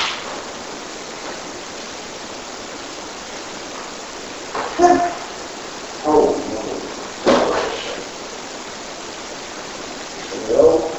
Unexplained Whimper 2nd Floor Hallway by apartments